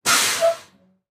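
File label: school bus brakes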